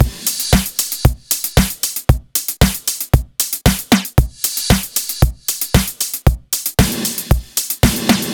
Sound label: 115 Beat; Zero-G - Future Wave